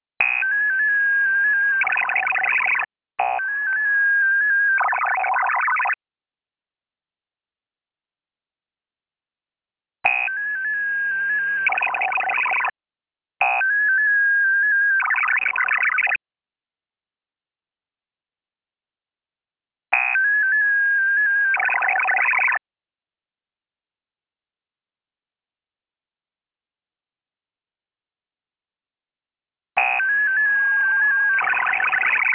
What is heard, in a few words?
ARQ-FAE 8FSK, 125 Bd, 8-FSK MODE; by Patrick Lindecker / F6CTE